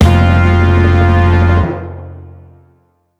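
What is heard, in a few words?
Boss Stage End Lose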